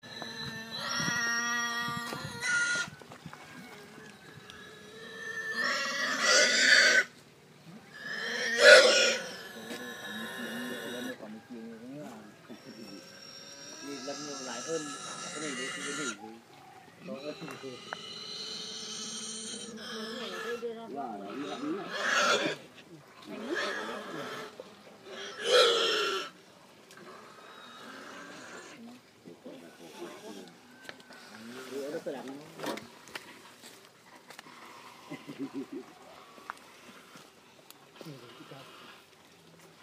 Schlachtung Schwein verblutet

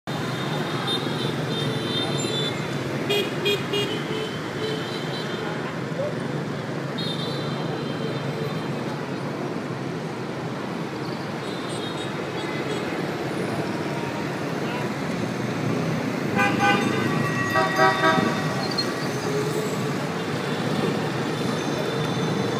Vor der Oper in Hanoi

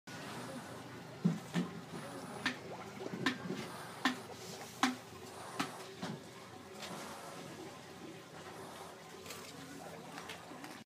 Schlachtung Schwein atmet

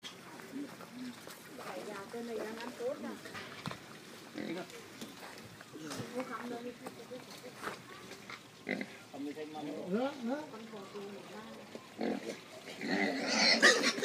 Schlachtung Schwein wird geknebelt

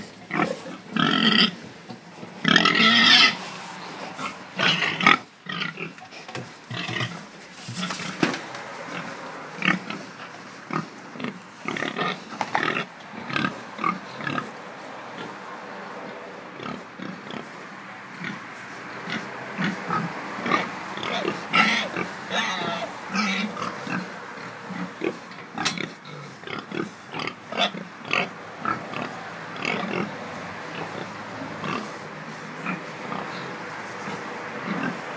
Mastschweine Begrüßung

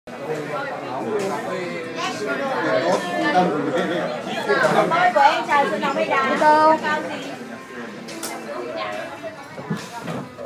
Im Cafe

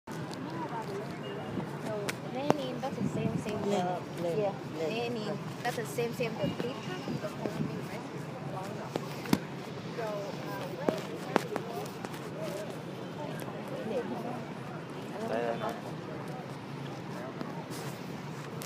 Vor dem Ho Chi Minh Mausoleum

